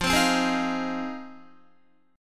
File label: F Minor 7th F Guitar; strummed